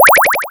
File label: pickup goal